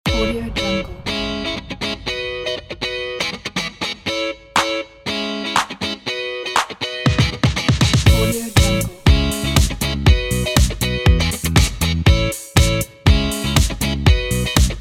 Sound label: Upbeat Energetic Pop; audiojungle